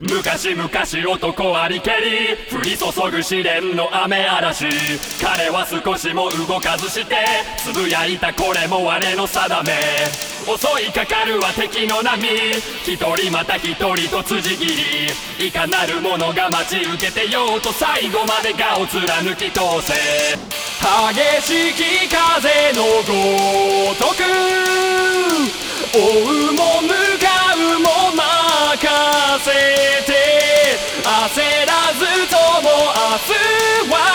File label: Acapella; Yorinuki Gintama-san